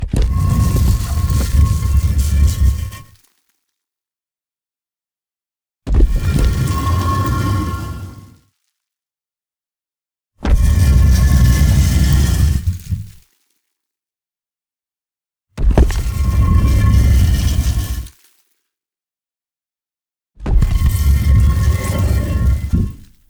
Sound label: DESIGNED TOMB Slide Open; Rock The Speakerbox; Hero